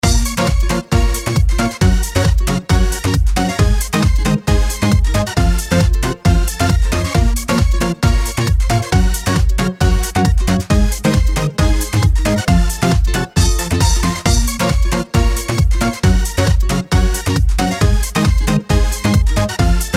Latino Tropical

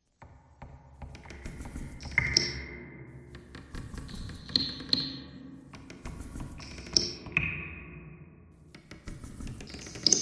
bagpipe thread noise; reverb

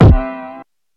splits; patatap

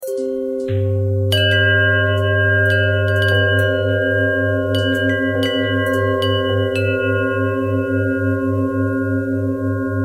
bell bell thread simple, bells

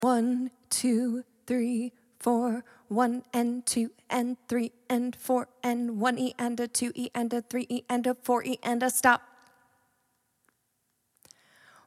drum counting

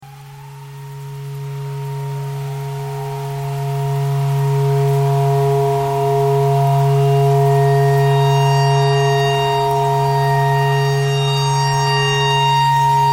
tornado siren clarinet thread pentatonic

